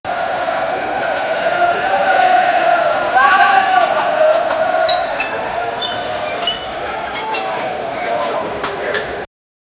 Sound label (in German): Bierzelt